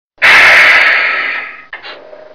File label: 18 Wheeler Air Brake